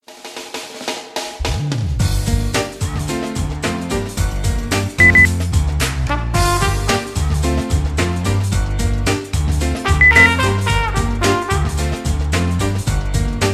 jazz beep

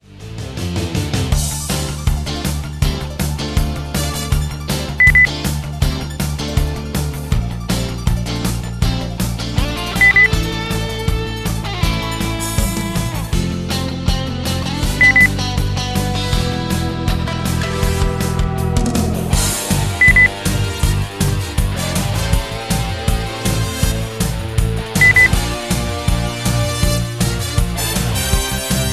rock

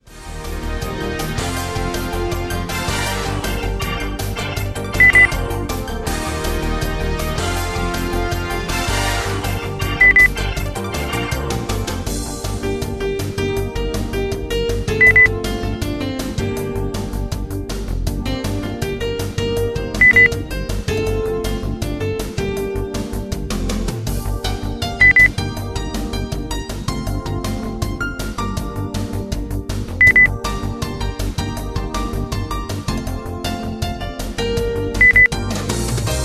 modern beep

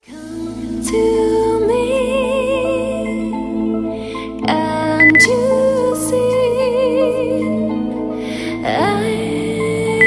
gesang beep

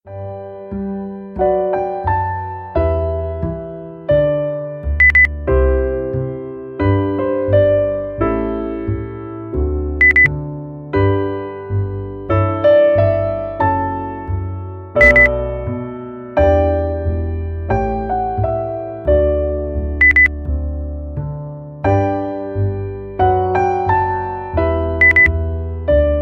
klassik land of hope and glory beep

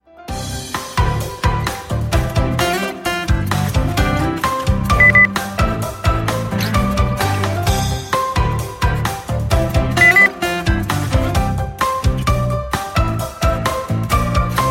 pop beep

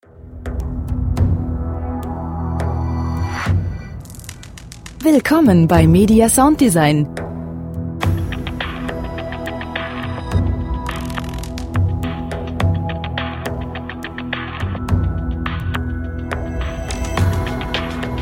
percussion

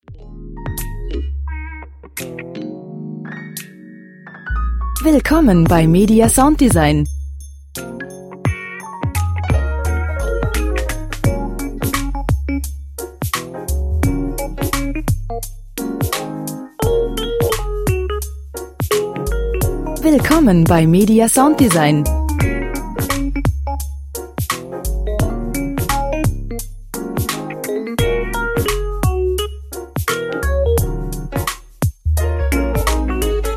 groovy

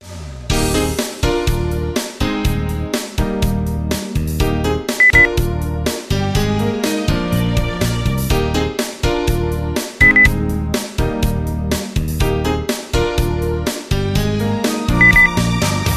pop

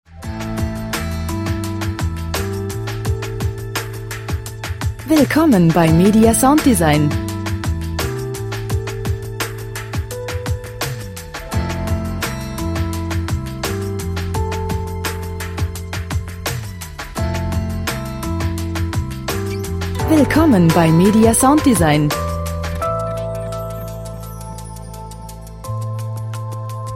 percussion minimalismus

